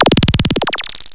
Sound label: Hack Flerp; AlgoMusic